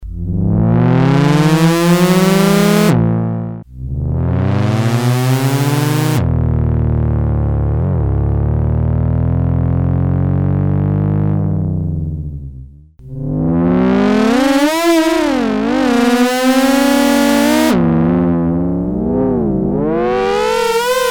Formula II Race Cars Passing Grand Stands, Prophet-5; Sequential Circuits Prophet-5 (Ver.3); Factory Patch; File 3 Programs